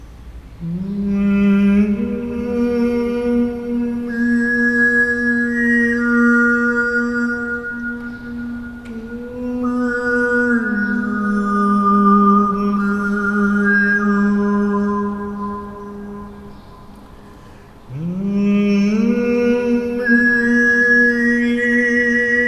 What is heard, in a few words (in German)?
obertonsingen church; westlichem Obertongesang; Westlicher Obertongesang